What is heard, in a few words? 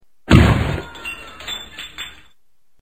lose